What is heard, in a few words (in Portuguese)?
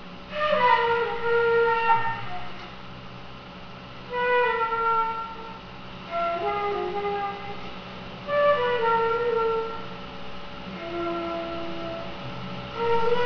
trompete